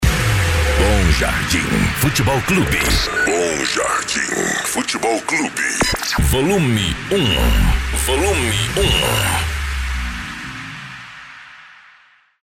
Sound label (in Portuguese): funk pisadinha sertanejo